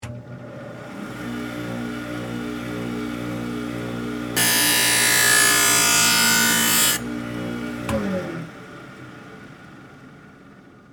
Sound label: Ice Skate Sharpen, Blade; Sports, Ice, Skate, Sharpener, On, Run, Once, Off